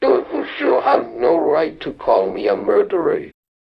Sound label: jingle